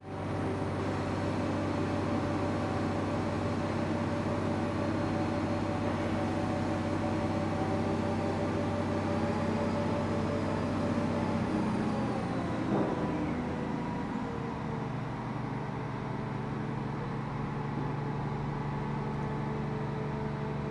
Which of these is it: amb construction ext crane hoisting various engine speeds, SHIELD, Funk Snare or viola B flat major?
amb construction ext crane hoisting various engine speeds